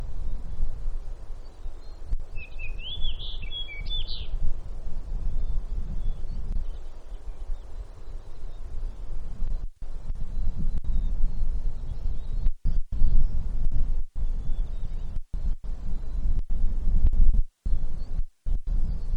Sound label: Fox Sparrow Song With Possible Calls and Chorus; alaska acoustic highlights